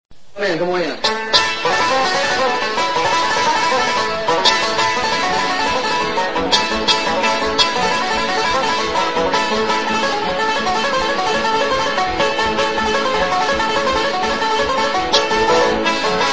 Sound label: Fiddle and Banjo Battle